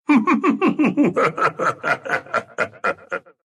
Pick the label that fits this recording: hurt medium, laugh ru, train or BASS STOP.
laugh ru